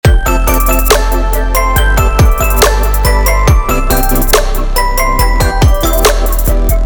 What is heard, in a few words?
harmonize IIIm